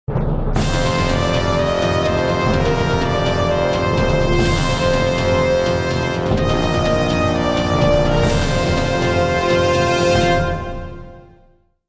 Medieval Combat; Civilization Revolution; Xbox

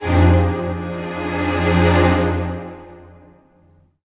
Music Battle; Lost Eidolons

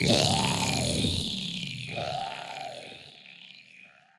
SFX - Zombie Effect

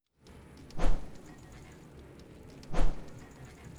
Swinging Blades; adams venture origins

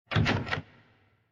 Locked Door; Decay The Mare

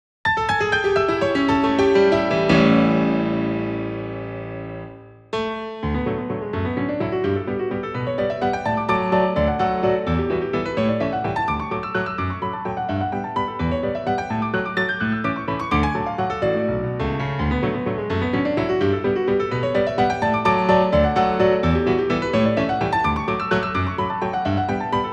Waltz; reco love original soundtrack 2017; Spinning Waltz

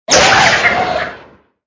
Blue Launch; Angry Birds Space

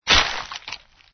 skeleton bone collision; Angry Birds Seasons

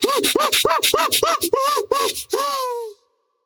inmate death; Devour

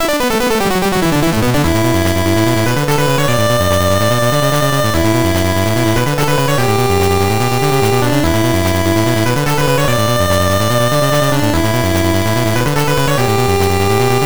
Jungle Battle; operation c gb; Area 1

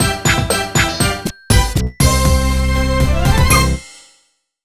Jingle WIN BPM100; super scribblenauts; nds

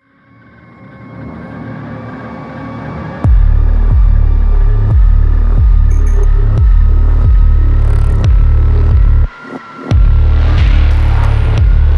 Main Ambient; Blood Harvester

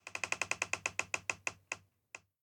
wood creak; devour 2021 gamerip pc macos

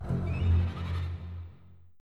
noise bat stinger; adams venture origins

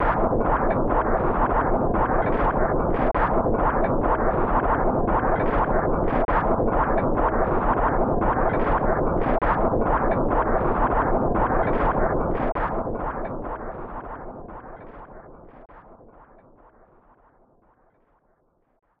Sinking Island Ambience; Super Gussun Oyoyo 2; SNES

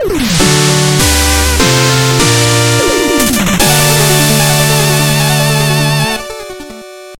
Music Victory Jingle; Shovel Knight King of Cards

